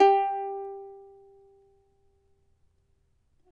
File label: charango G3